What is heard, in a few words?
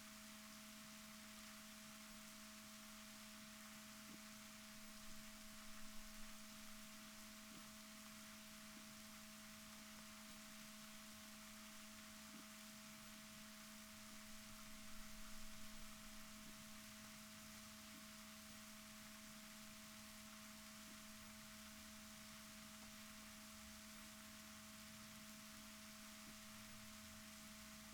rorquals negatif, Balaenoptera Physalus Chile